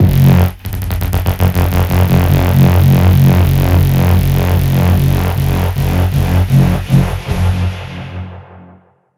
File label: mutant bass, fx